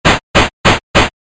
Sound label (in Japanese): 階段 移動 レトロ モノラル